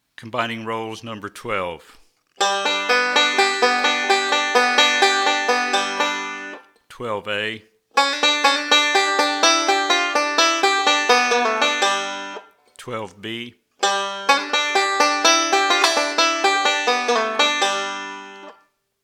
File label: Combinations Of Rolls